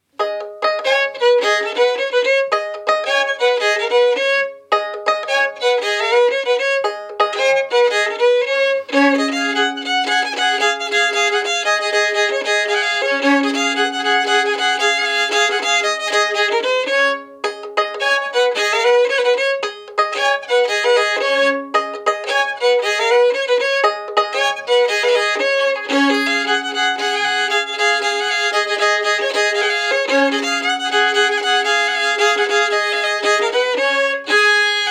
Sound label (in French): Suite d'avant-deux, Violon du Poitou